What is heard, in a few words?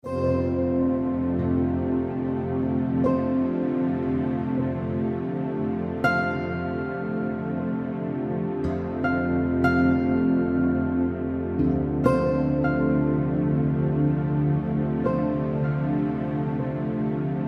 Reiki